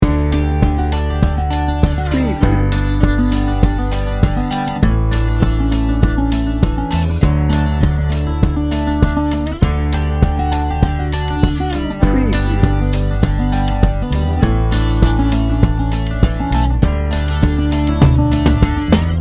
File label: americana sunrise loop